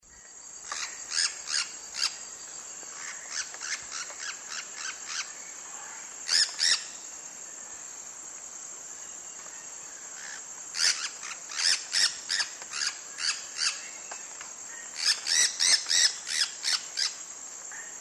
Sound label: olive throated parakeet, Belize birds birdsongs